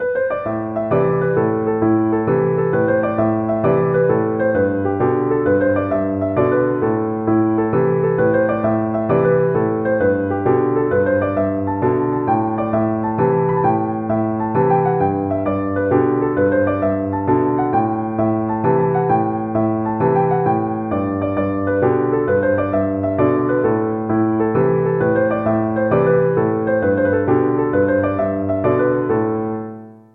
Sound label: rocky road piano